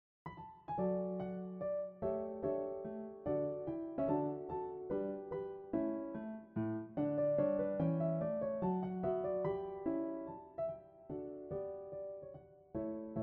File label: Piano